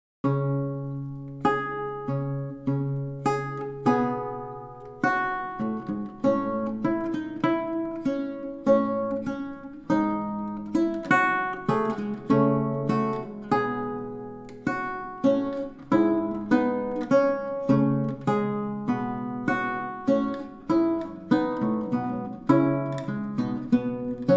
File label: lute; bonus est dominus